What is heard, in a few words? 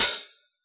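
T9 hi-hat Hard Open, Yamaha DJX; 155 DJX Kit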